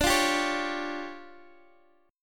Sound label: D Minor Major 7th Double Flat 5th, D, Guitar Open D